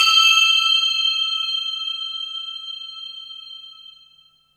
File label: C5, SynthLine; NiceBeats